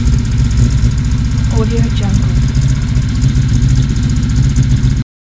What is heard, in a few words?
rally car idle loop; debsound